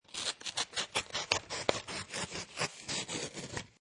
Paper Knife Cut Cardboard, Cut paper or cutting cardboard with a paper knife.; Classroom; Schools; Lynne Music